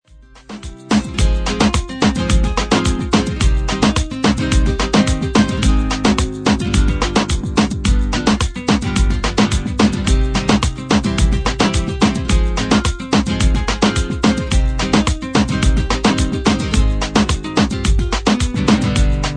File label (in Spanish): Reggaeton